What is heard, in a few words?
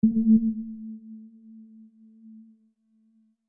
beep; cstrike